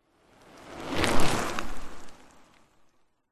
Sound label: bicycle pass by fast road slightly wet; zapsplat